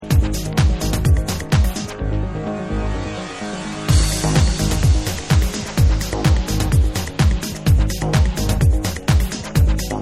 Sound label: Dub